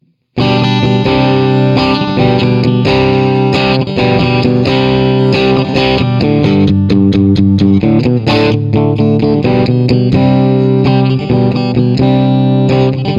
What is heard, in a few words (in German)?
Mittelpickup und Humbuckerstegspule